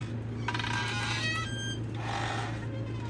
door squeak

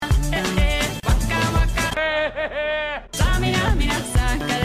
whehe

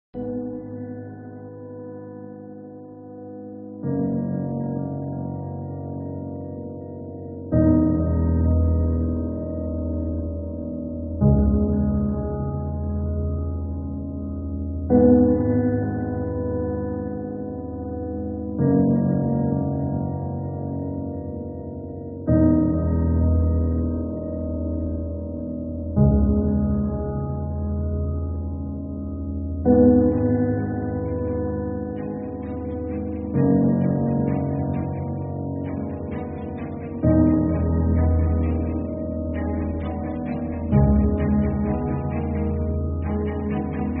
intense bgm

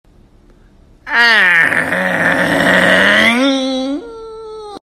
ainggggggggg

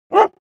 level 2 dog

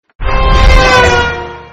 car sound

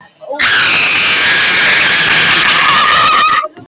aaaaaahg

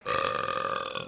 burp drunk

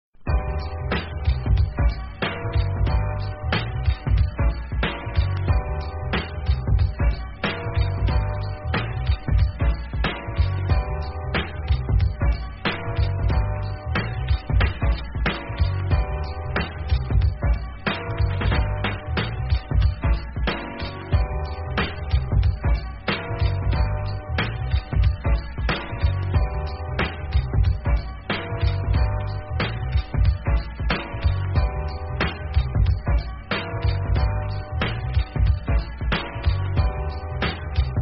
instrumental

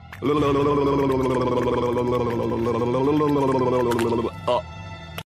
lick lick

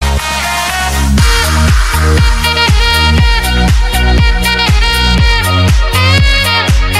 sax remix

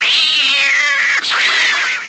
jumpscare sound; five nights at kitty

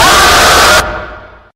jumpscare sound; five nights at villagers

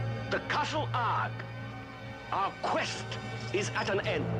castle argh

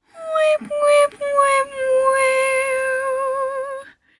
wha wha

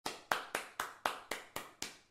slap

